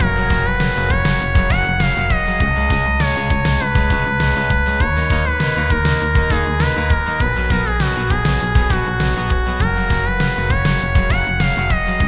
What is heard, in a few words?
harmony in life loop